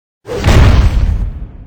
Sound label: attack earth; effect